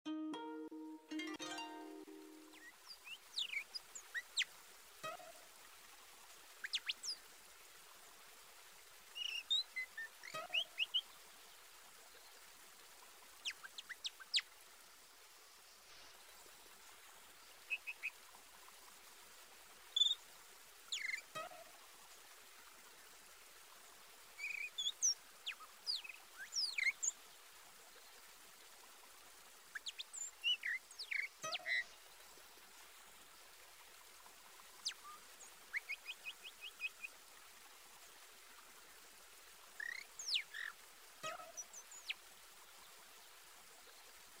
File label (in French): Multipiste sifflet rossignol